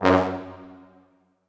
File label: F#1 R, Orchestral Brass; Best Service ProSamples vol.20; AKAI